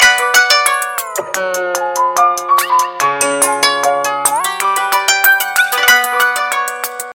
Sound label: new sms ringtone, electronic